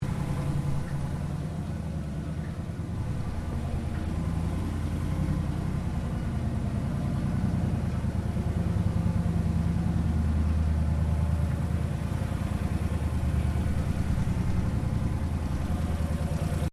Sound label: Cleaner Honda Gxv530 Polyvac Idle, Gas powered floor cleaner; Cleaning; Household